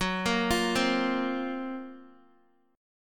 F sharp Major 7th Flat 5th, Guitar; arpeggiated; JGuitar

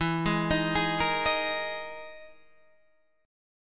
E Major Sharp 11th E, Guitar, Drop D; chord shape

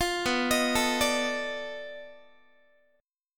B 7th Sharp 9th Flat 5th, down, Guitar; B7#9b5 arpeggiated; JGuitar

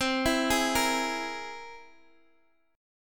C Augmented 7th, Guitar, Open D

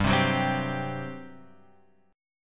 G Major 7th Suspended 4th, Guitar, Open F; strummed

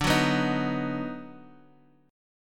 D sharp Minor Major 7th Sharp 5th D, chord

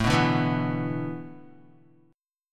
A Suspended 2nd Suspended 4th, Guitar; Asus2sus4; strummed; 4,2,2,4,4,x; 4F,4A,5C,5G,5C,6E; JGuitar

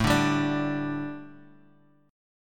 A-7th Suspended 4th-A-17,17,17,19-1-down; A7sus4 strummed

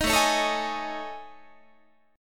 A Major 7th Flat 5th, down Guitar; AM7b5; strummed

